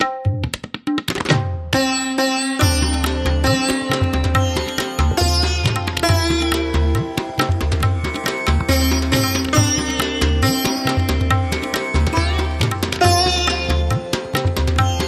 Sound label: Sitar Happy Birthday